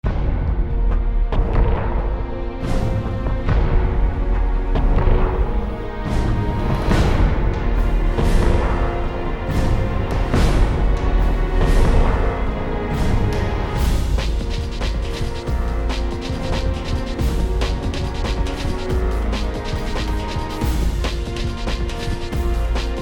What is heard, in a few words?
Suspense; timeline pc complete soundtrack